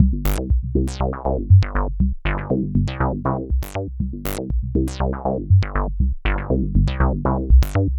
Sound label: Bassline, Prototechno